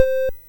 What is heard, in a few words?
Mini Golf, MOS6581R4